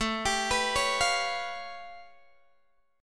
A Augmented 9th A Guitar Open G

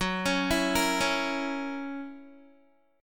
Gb 7th Gb down Guitar

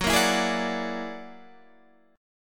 Gb Augmented 9th Gb down Guitar Standard; Gb+9 strummed

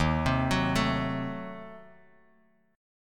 D sharp Diminished 7th D sharp, Bass Standard; D#dim7 arpeggiated; 11,15,16,14

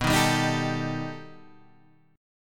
B Augmented 7th B; B+7 Chord {7 6 5 8 8 5} strummed

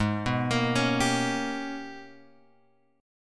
G sharp Minor Major 11th; {4 2 X 3 2 3} arpeggiated

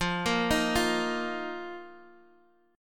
F 7th Suspended 4th F, Guitar, Half Step Down

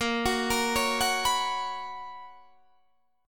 B Major 7th Suspended 2nd A sharp, chord shape

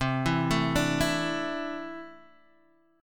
C 6th Add 9th, C, down, Guitar, Open G

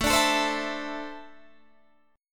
E 7th Suspended 4th Sharp 5th

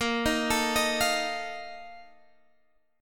Bb Major 7th Suspended 4th, Guitar Standard; x,13,13,14,16,13; arpeggiated

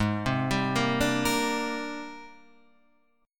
G sharp Major Sharp 11th, Guitar Half Step Down

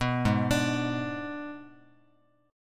G sharp Diminished G sharp, Guitar; G#dim arpeggiated; JGuitar

